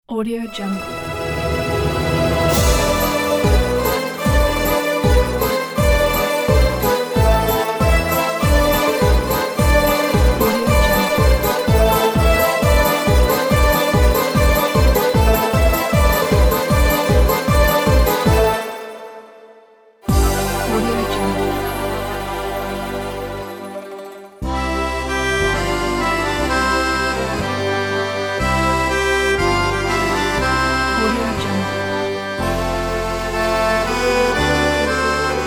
kalinka russian folk dance

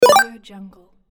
retro point

